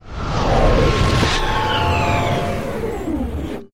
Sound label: exit hyperspace